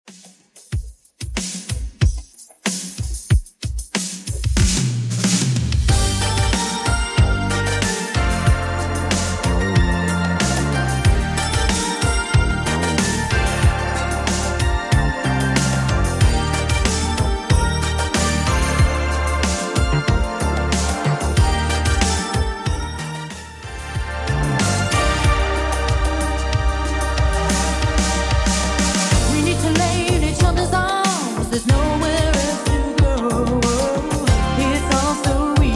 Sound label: 80s Redrum 93bpm CLEAN